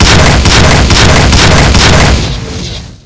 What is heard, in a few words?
flak; tobruk